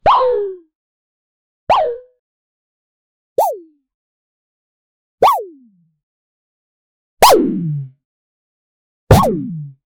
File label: an energetic and cartoon, boing